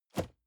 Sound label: cardboard box medium place; objekte